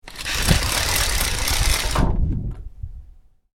Roller blind down, Roller Blind: Domestic, Roll Down With Cord; Household; Miscellaneous Household Sound Effects; Miscellaneous Household (Sound Effects:Household); Stuart Duffield